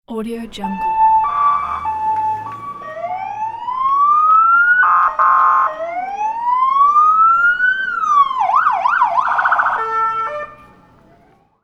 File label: police cars sirens in crowd; urban sounds